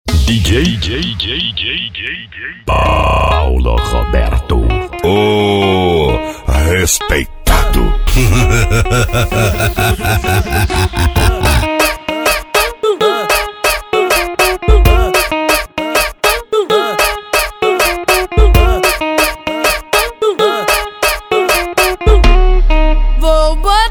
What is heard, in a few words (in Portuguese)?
eletro funk; cd na batida do eletro funk